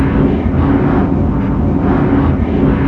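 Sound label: Engine Combo Exterior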